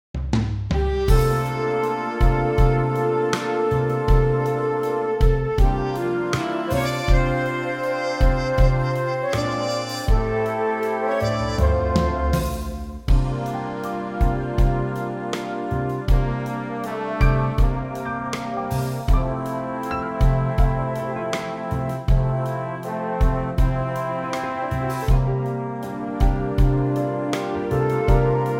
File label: Big Band